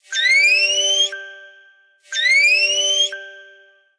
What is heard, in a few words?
gentle warning, alarms; Google; Google Sounds; Play It Loud